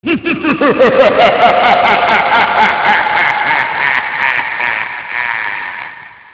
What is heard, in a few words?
evil laugh